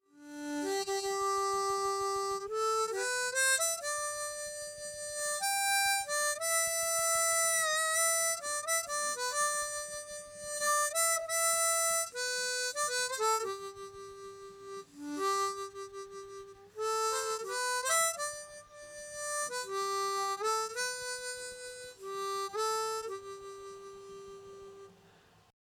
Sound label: harmonica solo; Shenandoah